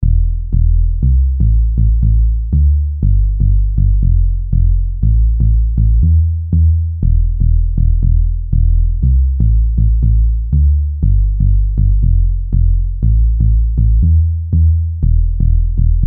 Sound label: deep bass